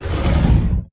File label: Push; Treasure of Nadia